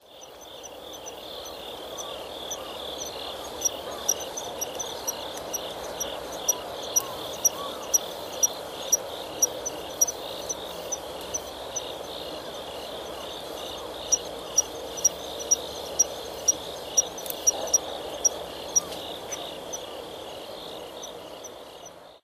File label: kanetataki; insect